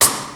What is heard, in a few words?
Snares, Rimshot